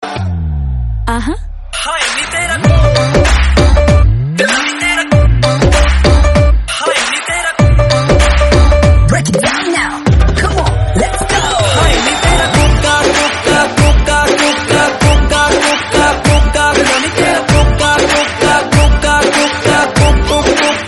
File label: dance music tone ringtone, bollywood